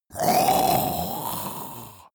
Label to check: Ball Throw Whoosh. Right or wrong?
Wrong